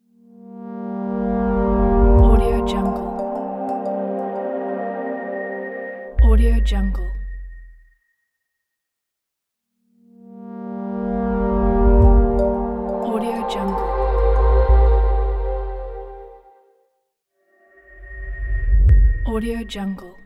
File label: elegant audio logo